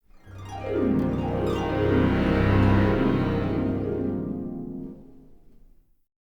Sad Sting; PS2; PSP

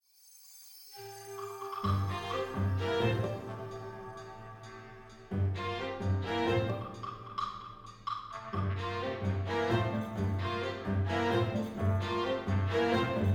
Field Battle Cave

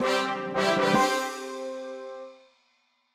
Intruder Alert Show Jingle

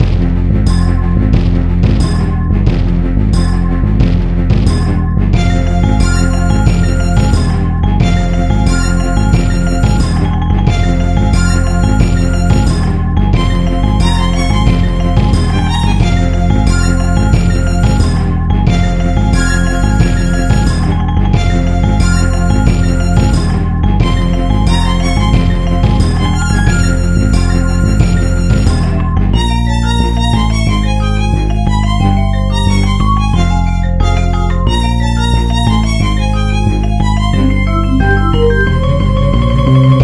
Night; A Quiver of Crows

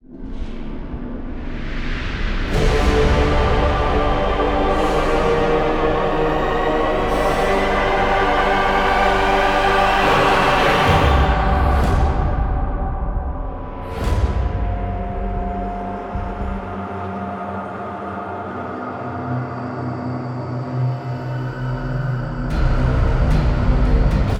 Musicintro; Advanced Gaming Platform Epica

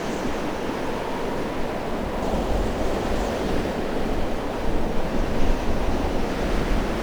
Amb Light wind

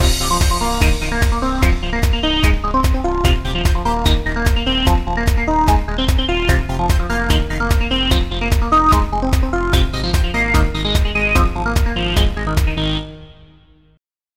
Continue; supercross kings

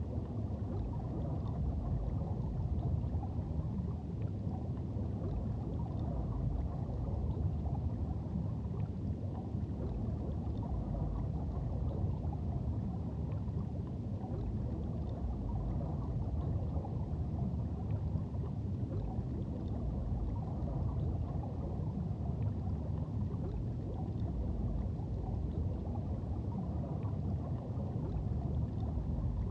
Snake battle Bottom ambient; Inmost

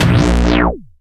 Boss Hit

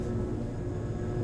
Pilon Servo loop

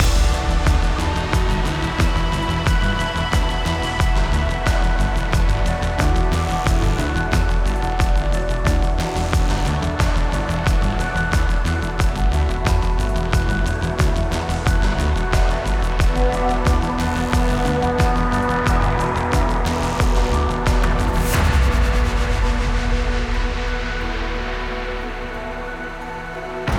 Distress signal; Sleeping Forest

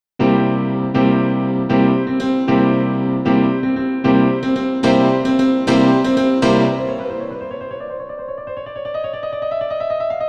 Waltz; step steady complete sound track

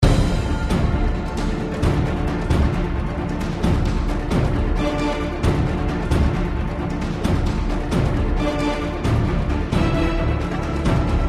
Falling Music

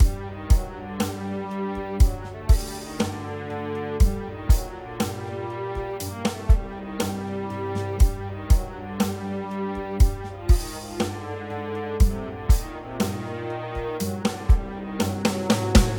Tension Loop 120bpm 8bar; project zomboid

